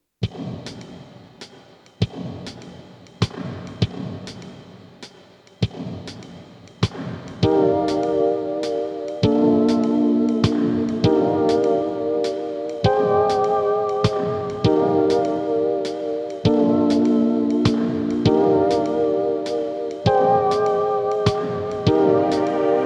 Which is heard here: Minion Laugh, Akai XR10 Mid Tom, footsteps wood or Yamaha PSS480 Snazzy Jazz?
Yamaha PSS480 Snazzy Jazz